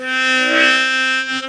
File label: harmonica note; Harmonica Multisampled